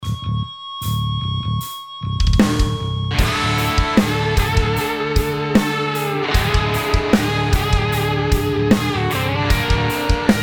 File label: Ludwig Supraphonic I, snare